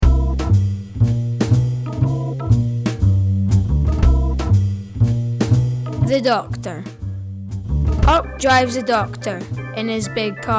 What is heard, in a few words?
backing